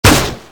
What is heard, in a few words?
crossbow; csco; csgo